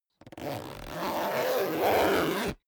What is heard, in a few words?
zipper down jacket close